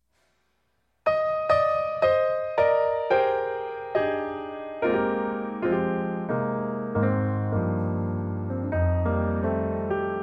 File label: Piano Interlude